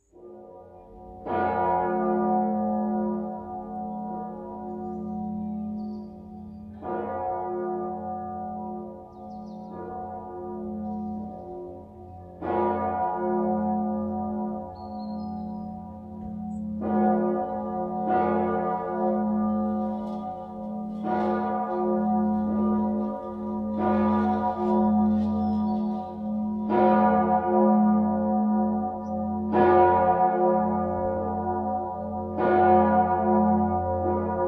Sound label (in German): Hochfest Vorlaeuten, Domglocken